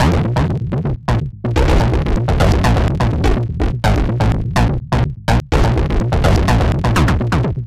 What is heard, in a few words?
Bass Line; Eclectic Electronica